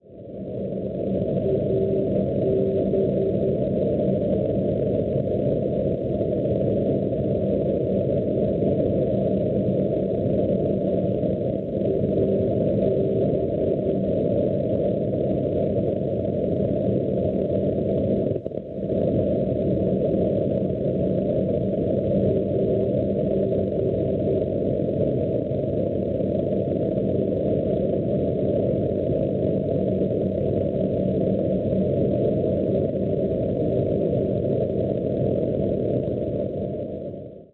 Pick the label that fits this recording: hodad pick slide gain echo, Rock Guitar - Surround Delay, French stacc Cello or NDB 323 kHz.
NDB 323 kHz